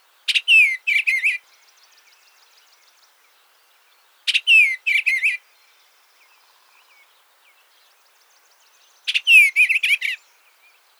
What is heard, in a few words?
Eastern Bluebird